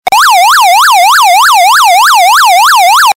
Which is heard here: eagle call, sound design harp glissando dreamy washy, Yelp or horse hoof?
Yelp